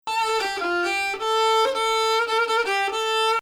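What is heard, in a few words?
Bayati Samai Husseini Nahawand, Arabic music; admittedly, first 3 notes could be parsed as 2nd Ajam 3/6; Samai Bayati il-3ariyan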